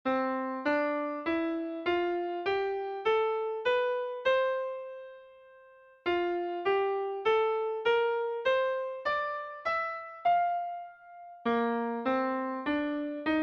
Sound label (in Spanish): Escalas en diferentes tonalidades